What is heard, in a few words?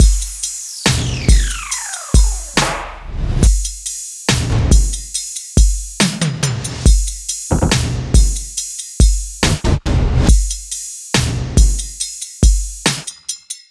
Drums A; Trap (Трэп); Asterio